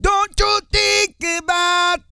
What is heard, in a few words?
REGGAE, Vocal Planet World Voices